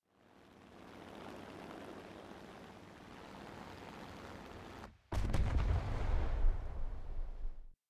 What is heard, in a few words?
crash water